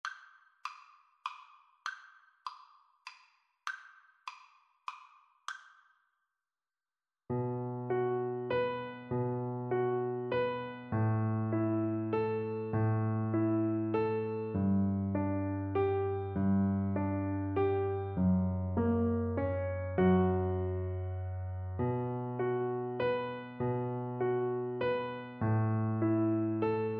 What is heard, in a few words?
greensleeves, Double Bass